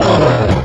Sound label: fall; ogre